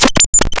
Cwejman S1 Morse Coder Snare Message; DevSnd Cwejman S1 - JK; DevSnd Cwejman S1 - JK Samples

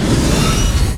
sfx sci fi; Masterbits - Switch FX

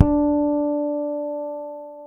HARMONIC D, PEDULLA BASS; Masterbits CLIMAX 7 - Bass

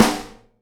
SNARE, Live Snares

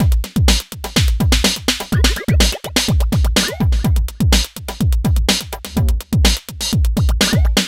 NRG Break, Trance, Drums, Breaks

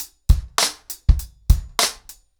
100BPM, ACOUSTIC DRUMS; The Roots Drumloops

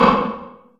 LARGE ROOM 909, REVRB; TR 909

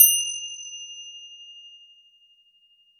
finger cymbal B; FINGER CYMBAL 2

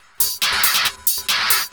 percussion loops, 138, Techno

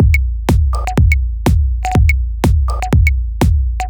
Teknik E 123bpm, House Drumloops; Zero-G House Fabrik Drumloops

